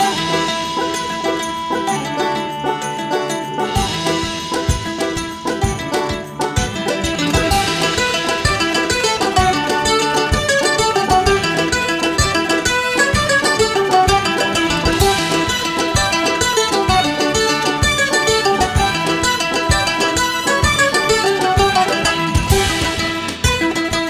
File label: Swallow Tail Jig; Gnomz; WiiWare